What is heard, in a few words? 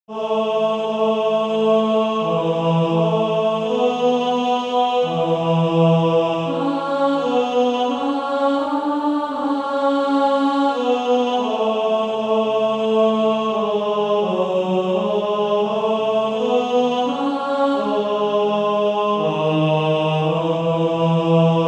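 Male Choir, tenor 2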